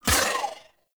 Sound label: Dodge Impact; Warframe; Pacifist